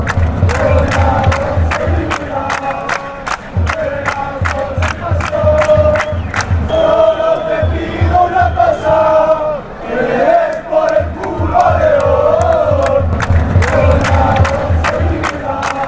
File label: passion fanchants